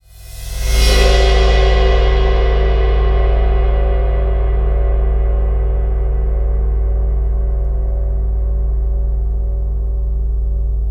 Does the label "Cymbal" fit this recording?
Yes